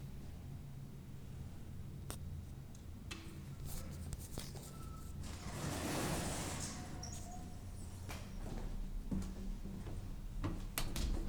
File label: Elevator Ride